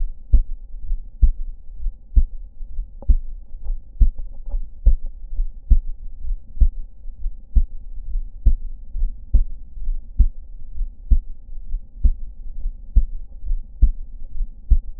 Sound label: diastoilic music, cardiac auscultation